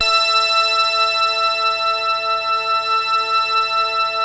PPG A4; Hollywood Edge - Giorgio Moroder Rare Synthesizer Collection; PPG.2.3

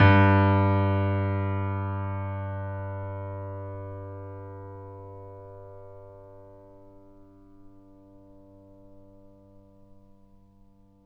Grand Piano MF G1; AKAI S6000 CD-ROM - Volume 2; Grand Piano 256M

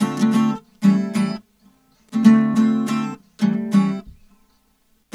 Guitar, Urban Grooves; Sonic Foundry (Sony Creative Software) - Stylus Pressure Urban Grooves on Digital Wax

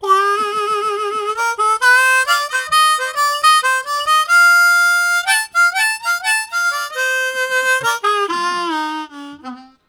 Building, Harmonica, Riffs; Zero G

